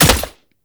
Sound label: Shot Mech, Weapons; Warframe; Cedo Prime